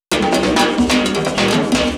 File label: Fill 128 BPM; Loops; Vengeance Samples